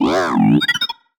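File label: Sci-Fi Droid Robot Emote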